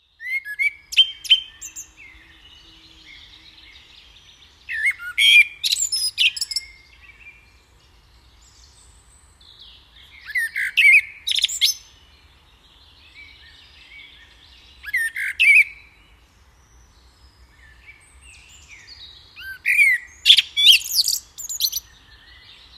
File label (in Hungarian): Feketerig Turdus merula